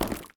rock Break; Mindustry

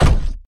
block Place; Mindustry

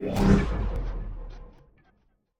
wave Spawn; Mindustry; ui

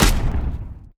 shoot Breach Carbide; Mindustry

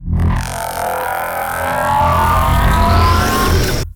accelerator Charge; Mindustry

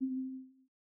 press; Mindustry

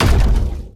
mass driver; Mindustry

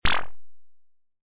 bloop; Mindustry

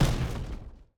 unit Explode; explosions; Mindustry

